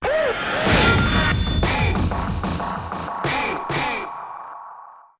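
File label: Interrogate Success; True Crime New York City; Xbox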